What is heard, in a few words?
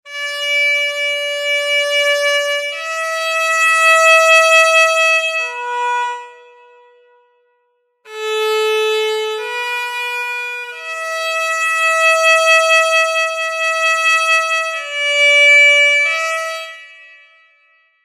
Hichiriki